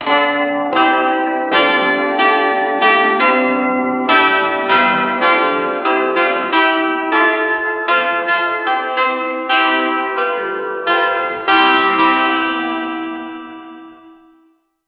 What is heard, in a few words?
anthem on harp; ussr; historical